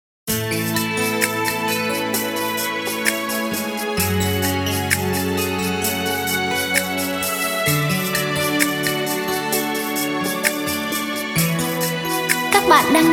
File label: beat, playback